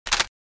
Pile of CD and DVD cases dropping a disc

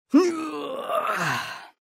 Teenager yawn and stretch

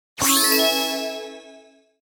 Casino slot machine bonus win

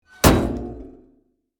Tank hatch closing

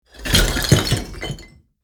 Flipping various block pieces on a pile of rock

